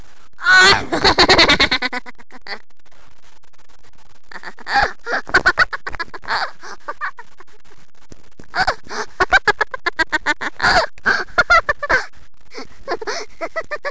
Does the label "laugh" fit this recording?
Yes